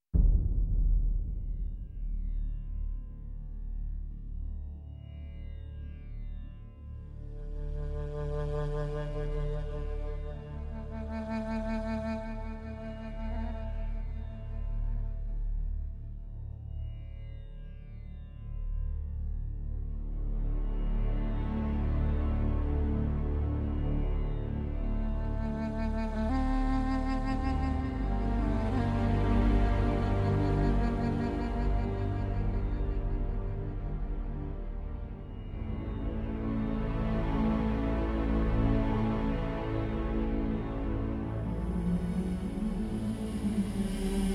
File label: Instrumental